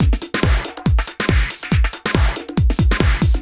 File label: TECHNO BEATS 140